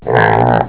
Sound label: PIG GRUNT